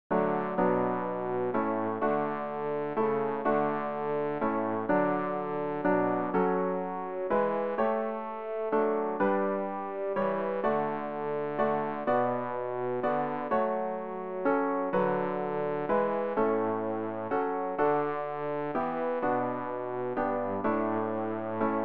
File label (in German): bass, Übehilfen; Ref. Gesangbuch; RG 057: Nun jauchzt dem Herren, alle Welt